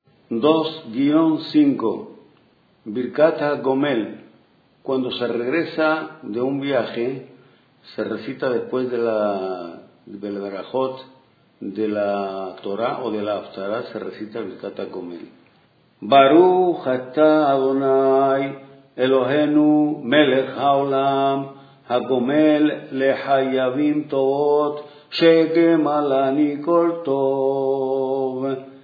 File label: Birjat Hagomel